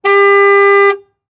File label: Truck Ford Ranger Honk Horn Long; transportation